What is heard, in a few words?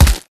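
Stalker Movement Synced Melee Facepunch Punch; Titanfall 2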